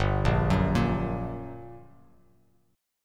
G Minor Major 7th G down Bass Standard, GmM7 chord; arpeggiated; JGuitar